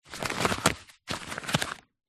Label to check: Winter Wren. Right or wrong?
Wrong